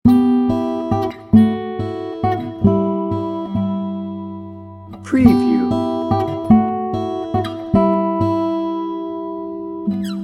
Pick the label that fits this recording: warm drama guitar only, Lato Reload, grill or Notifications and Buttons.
warm drama guitar only